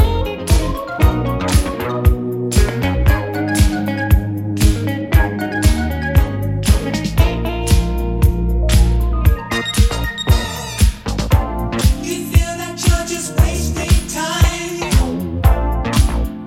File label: Dancer instrumental mix